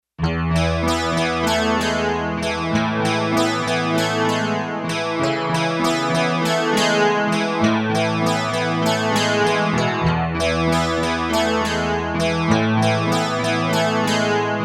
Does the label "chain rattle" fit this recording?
No